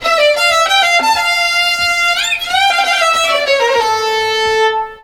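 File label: Balkanish Traditions strings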